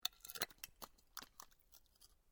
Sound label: METAL OBJECT SMALL MOVED